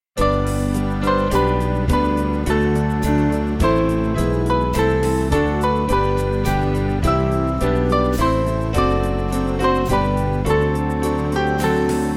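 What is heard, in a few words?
Music Backing Files for Churches; Llwynbedw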